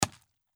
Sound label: impact squeeky